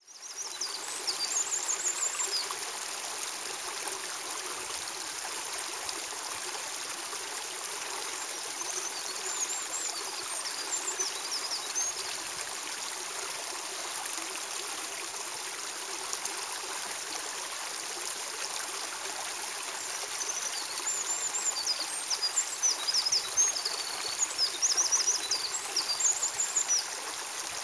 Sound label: song